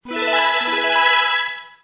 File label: win